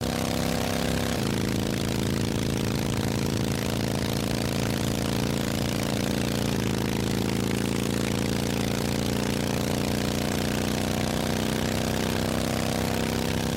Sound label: motorcycle